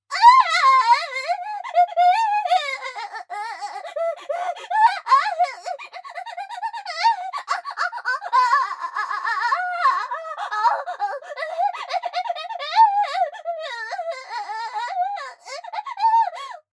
クンニ・喘ぎ・加速; アスティリア

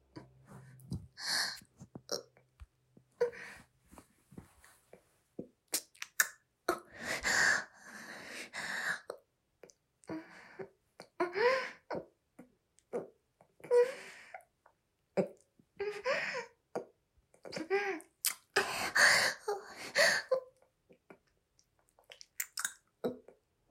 オホ声

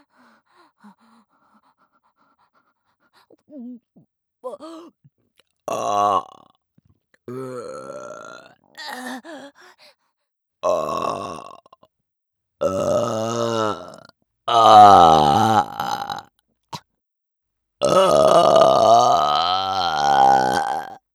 ザーメンゲップ

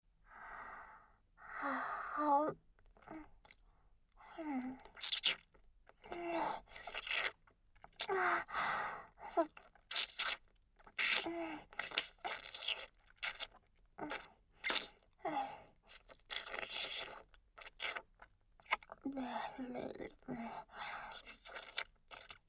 煽り事務的フェラループトラック, ASMR

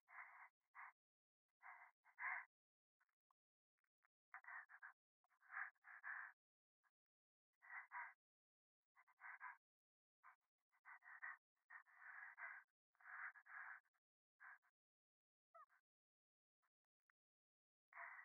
電話 左耳快感吐息

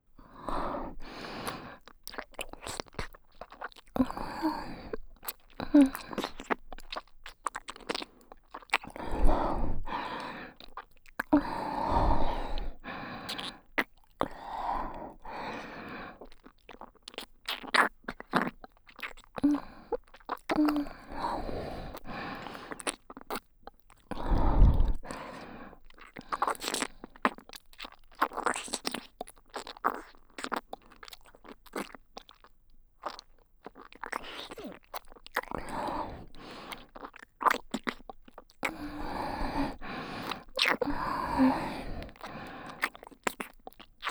エロ吐息多めの密着耳舐め※左耳ループトラック, ASMR

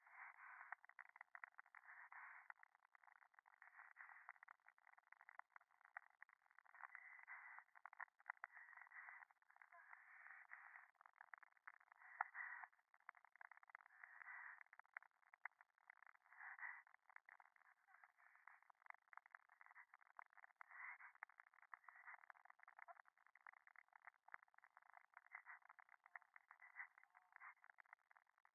電話 左耳密着吐息 暖炉

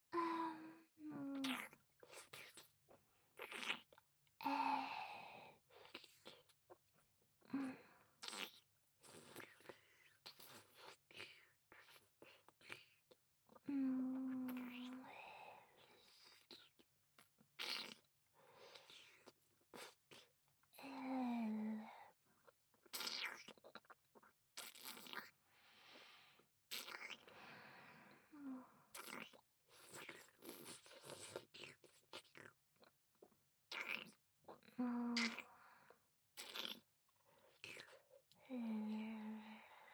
フェラ, ASMR

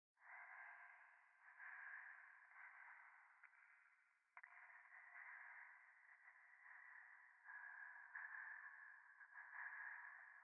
ゴースト 右耳快感吐息

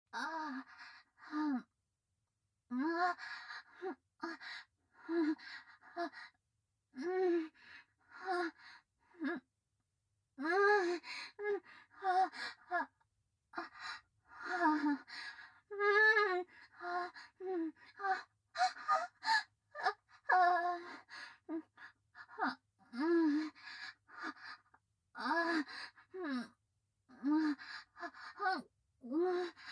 左斜め前・喘ぎ声・普通; ミーシャ

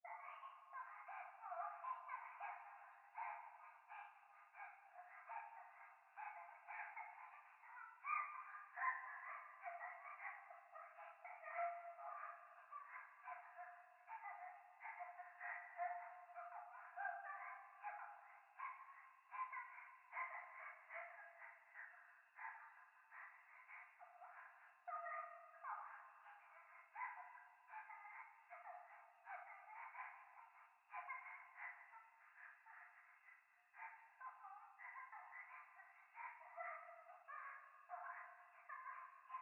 教会 可愛い喘ぎ 山奥夜

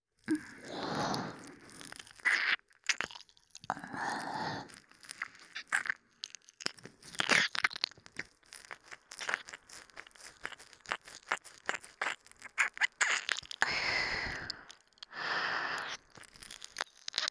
耳舐め, ASMR; SEあり

